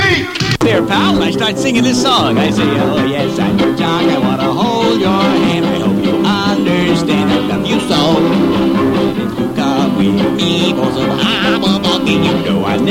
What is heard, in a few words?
Singing; Grease sings